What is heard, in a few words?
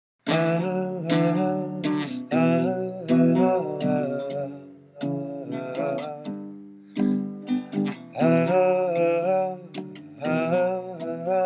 Chorus